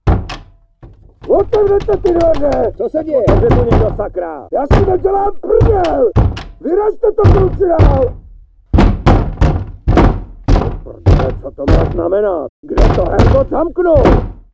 door; Mafie 1

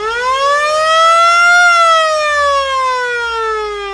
sirene; Mafie 1

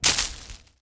bush; Mafie 1

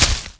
bush; Mafie 1

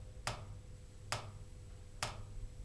drop; Mafie 1

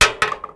metal; Mafie 1

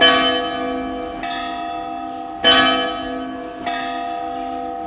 bell; Mafie 1

